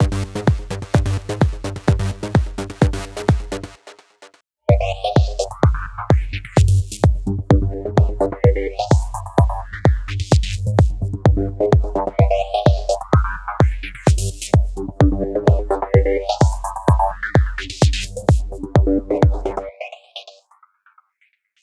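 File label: spectral techno bass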